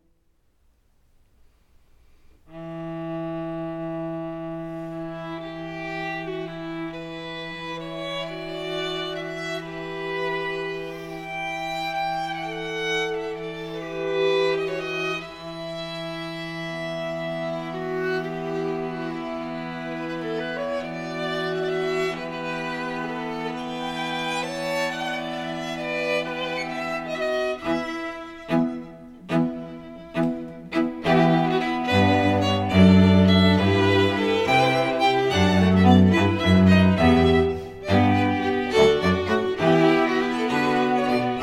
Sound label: string quartet